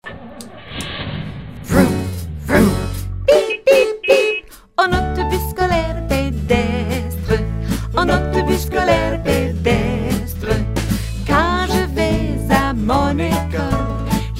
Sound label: French sample; The Walking School Bus